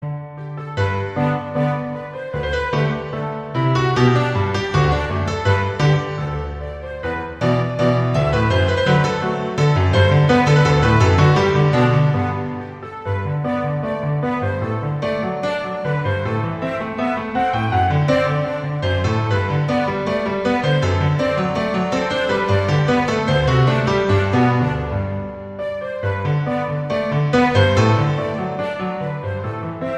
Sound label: Orchestral Version